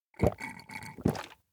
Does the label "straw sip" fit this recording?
Yes